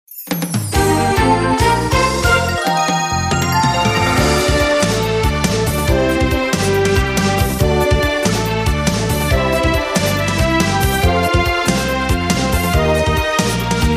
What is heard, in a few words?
Victory Announcement; Ar tonelico: Knell of Ar Ciel